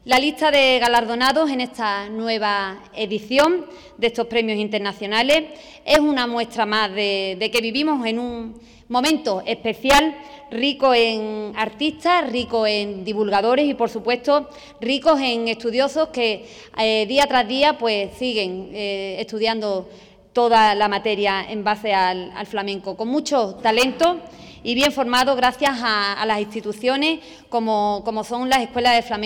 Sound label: flamenco